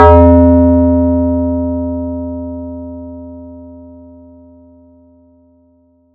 FX RND BEL; ModelCycles

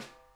SNARE EDG; Sonic Reality; Interactive Drum Kits

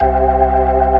B3 C 2-4

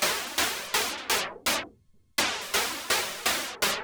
Juno-60 Arp 125BPM; noise hiss crackle

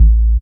DR. LOOP, BASS; X-Static Goldmine 3

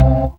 DM ORG1, Dance Mega Synth

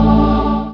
synth choir synth; Best Service Gigapack 1

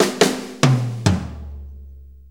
6-8 Fill, Drum Tools

